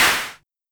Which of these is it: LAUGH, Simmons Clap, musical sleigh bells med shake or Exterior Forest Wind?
Simmons Clap